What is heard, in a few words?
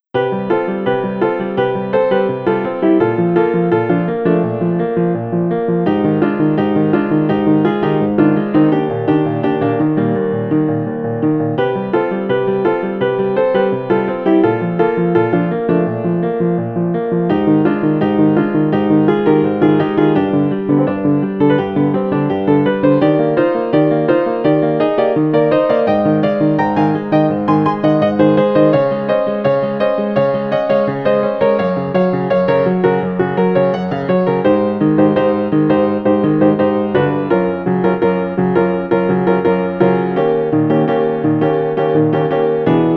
piano; Kirbys Block Ball